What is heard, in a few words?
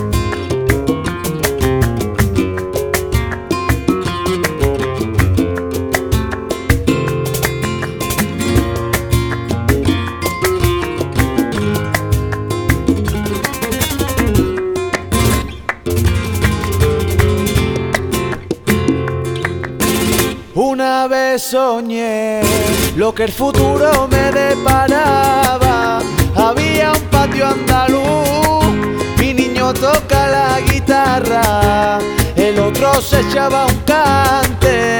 Flamenco; Fondo Flamenco